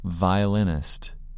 violinist

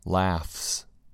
laughs

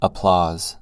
applause

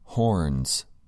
horns

